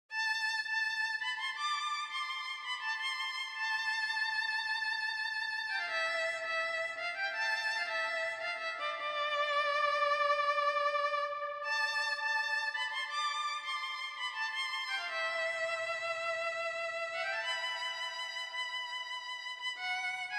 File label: violin